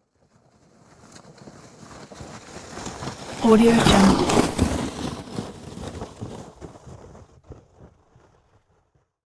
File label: toboggan pass by